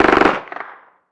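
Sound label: gun mini uzi distant; ModernWarfare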